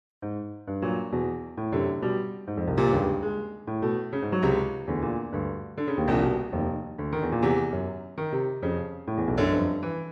solo piano

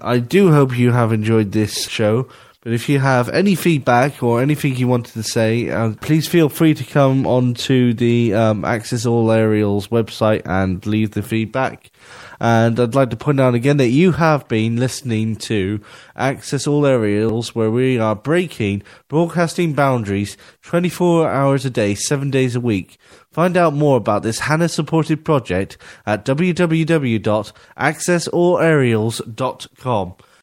end of show jingle

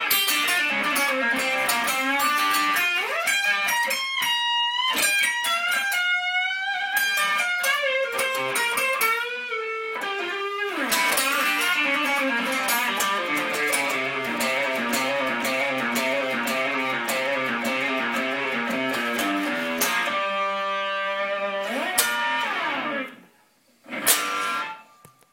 telecaster

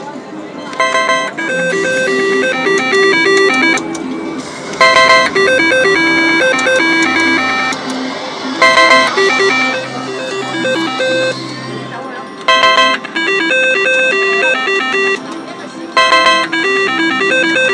ding ding ding

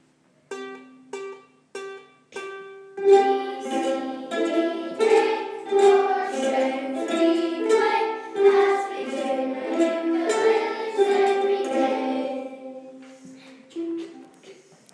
4 strings with words

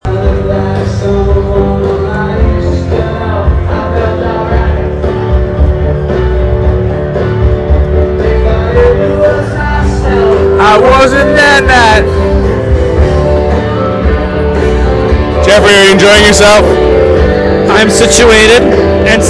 handshake

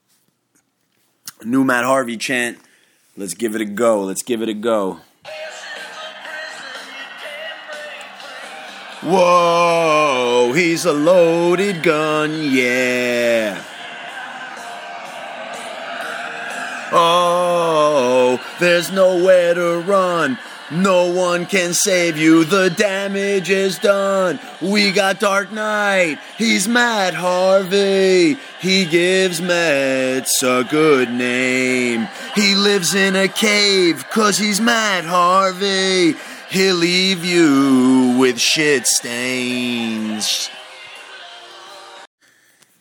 dark night chants

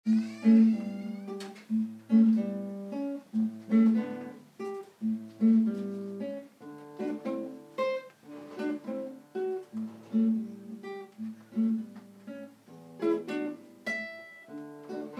guitar solo

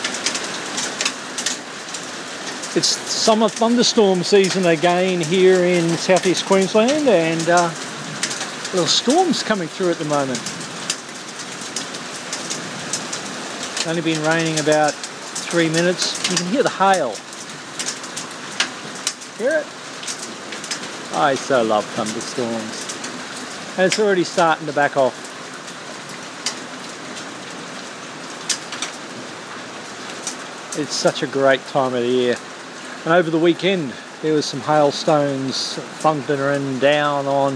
summer thunder storm

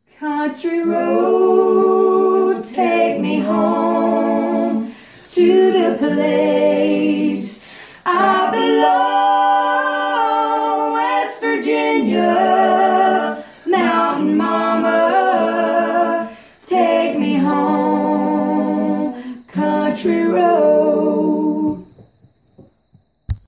sing chorus acapella

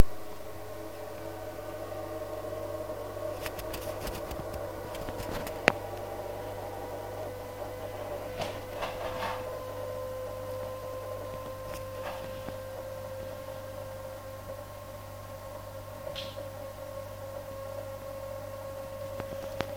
fridge

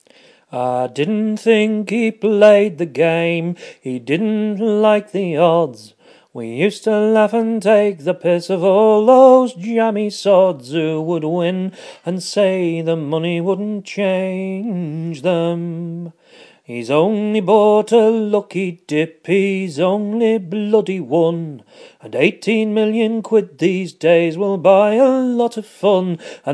me singing the lottery song

